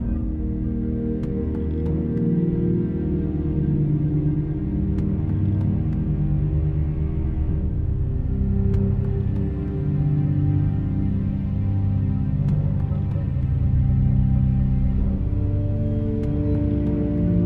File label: Loop Exploration; the walking dead michonne